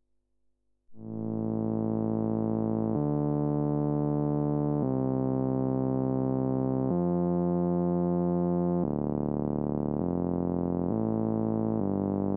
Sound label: Solina Bass